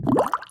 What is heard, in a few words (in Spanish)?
Burbujas; Estanque